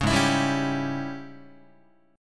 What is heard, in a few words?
G sharp Major 7th Suspended 4th Sharp 5th, Guitar; 4,4,7,5,5,6; JGuitar